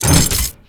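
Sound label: Crane Move Vertical End; Venus Open World; Objective Rooms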